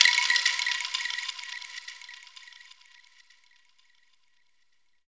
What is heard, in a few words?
ETHNO VIBRA; Drums In Your FACE!